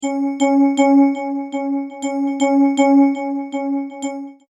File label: Multimedia Tone-Thinking/Processing; Tones; Sound Design; Multimedia Tone-Thinking/Processing 6; McKinney Sound